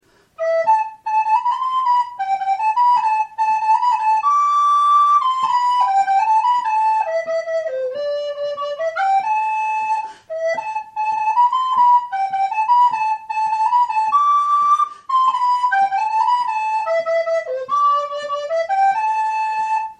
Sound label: jig, FLUTE; claudine